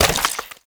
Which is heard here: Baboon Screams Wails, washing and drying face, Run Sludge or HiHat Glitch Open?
Run Sludge